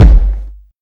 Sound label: fat kick drum sound g key